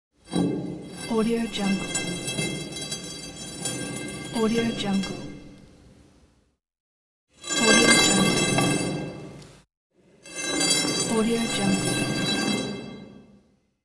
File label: moving a metal object